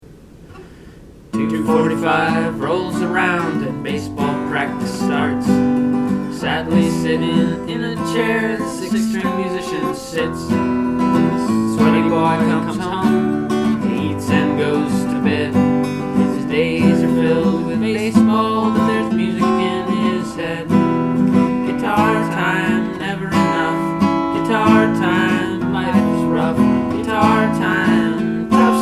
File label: Guitar